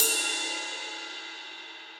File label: Ride Mid Studio Basic; Drums; Drum Hits; Standard Hits; Ableton; Session Drums Studio